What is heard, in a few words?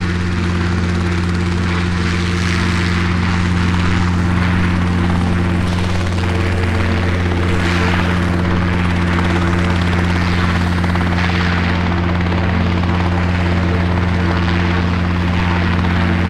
transportation aircraft helicopter idle ground; sound effects one